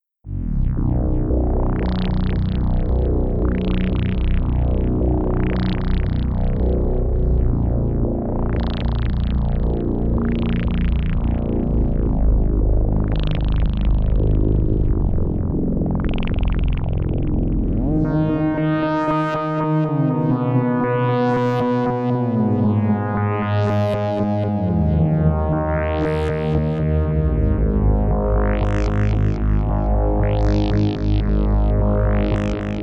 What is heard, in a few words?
deep water sequence